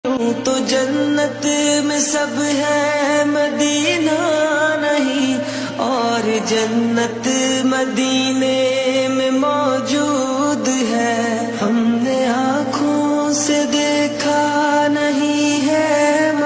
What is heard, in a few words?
islamic naat ringtone, arabic ringtone, islamic ringtone